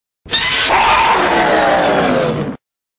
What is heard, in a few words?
king roars